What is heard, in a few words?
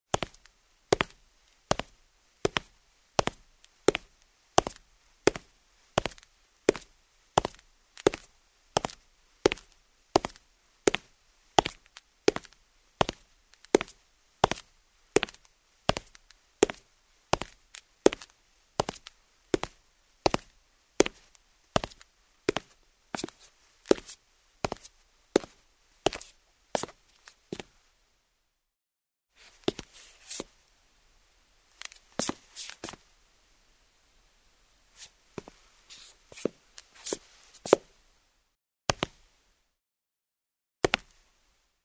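footsteps on marble floor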